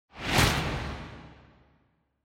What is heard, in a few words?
Motion Slam Reverb